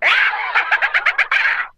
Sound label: laugh witch